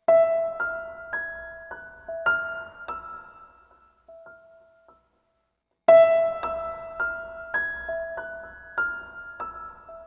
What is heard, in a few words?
One shot Piano